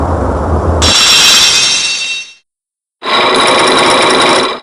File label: black lock end; psychelock